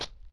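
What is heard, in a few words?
shuffle, footsteps hard surface jump up; grunt footsteps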